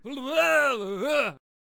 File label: Brawler Death Vocals